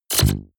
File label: sci-fi shock squeeze